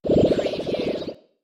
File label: Scifi Production Element - Chemical Reaction